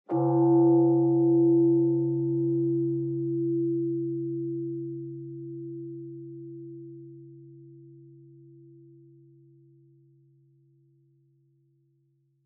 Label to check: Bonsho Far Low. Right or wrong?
Right